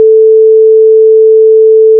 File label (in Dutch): grondtoon